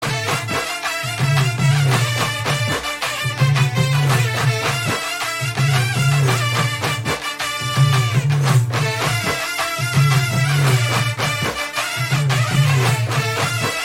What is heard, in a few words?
Drum Beats Bgm